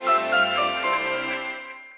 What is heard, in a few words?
Power Off; sgh-d730; Digital